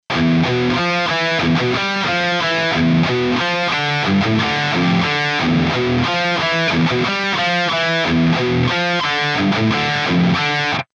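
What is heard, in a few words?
Bogner Uberschall; NUX MG-300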